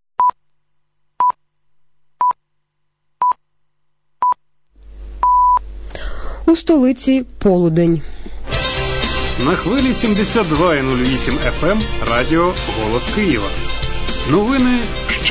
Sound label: interval signal; ur vo kyiv